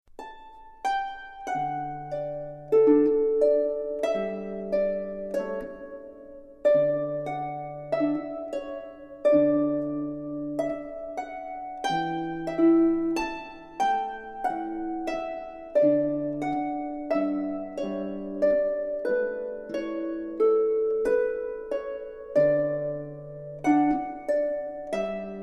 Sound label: Cherry reel slow